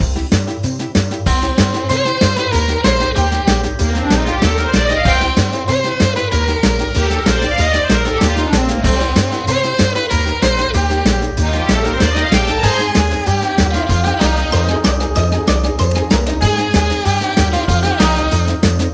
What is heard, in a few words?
Music Minigame; cartoon network punch time explosion xl 2011 gamerip